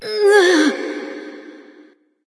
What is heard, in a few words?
grunt lift light; smite; voicepack; hel